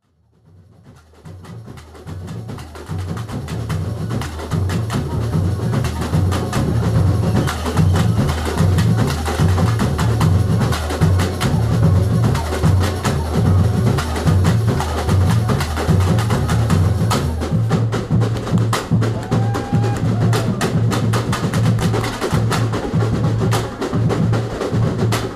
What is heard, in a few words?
show bateria